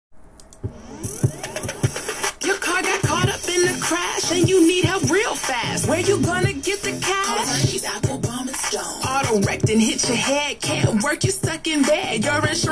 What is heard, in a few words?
Jingle; Applebaum and Stone